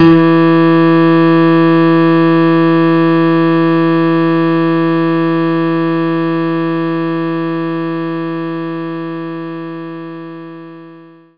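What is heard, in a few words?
acoustic guitar E3; knights try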